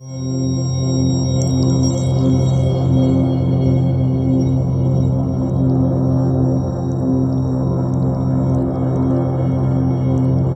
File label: Pad; Dream Zone